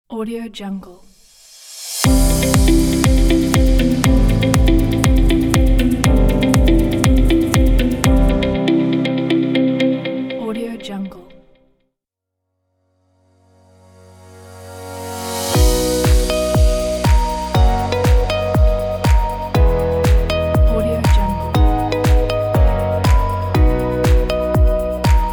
corporate logo; corporate logo pack

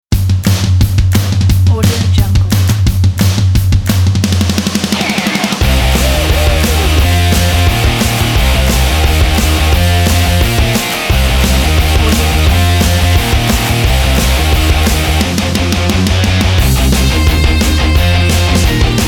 fast rock kit; cinematic alex

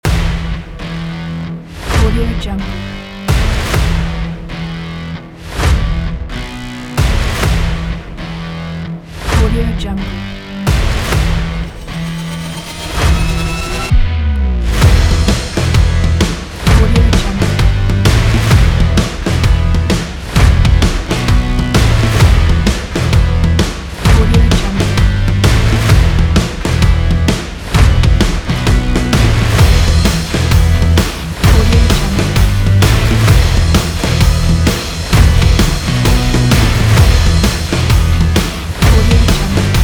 in action sport

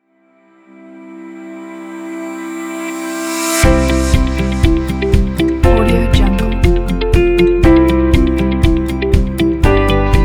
promotional

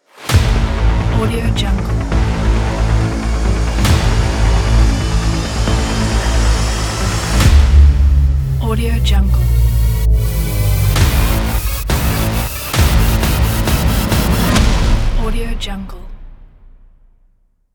impact cinematic intro

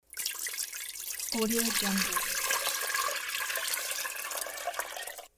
pouring water into a pot

